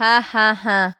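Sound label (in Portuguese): Riso forçado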